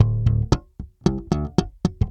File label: bass loop, Rock; ACID MUSIC